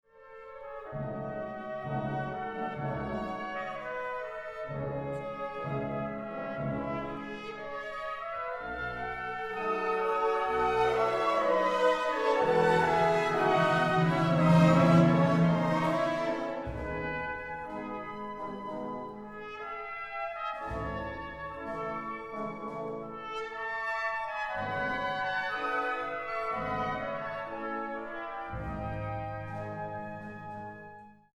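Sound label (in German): Sinfonie Nr. 5 cis-Moll, Trompete + Viola; G. Mahler; trp + vla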